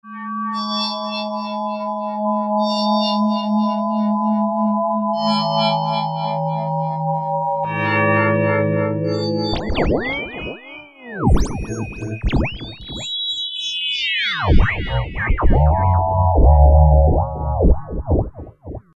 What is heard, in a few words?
Glass Columns, Roland JP-8000; Factory Performances